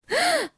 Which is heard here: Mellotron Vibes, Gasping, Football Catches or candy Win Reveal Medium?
Gasping